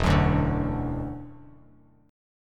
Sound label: E 7th Suspended 2nd, down, Bass Standard; E7sus2; strummed